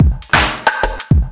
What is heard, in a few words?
Weird Wood, Synchro Funk, Beats; Sonic Foundry (Sony Creative Software)